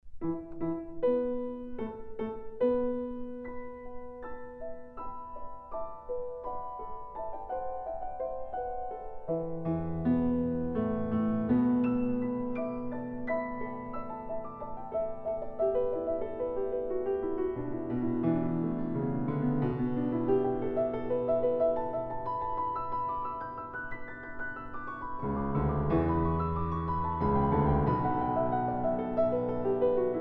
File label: improv, On this Day Earth shall Ring